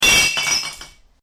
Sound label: Bottle Smash Distorted; Smashes; Objects; Crafted Signals